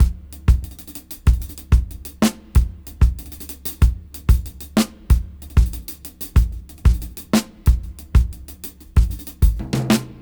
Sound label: Ragga 93 BPM; AMG - Keith LeBlanc Phat Butt Jams